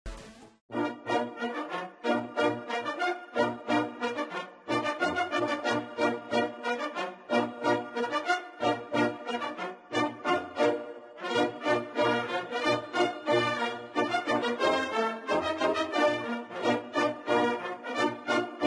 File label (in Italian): La Fanfara dei Bersaglieri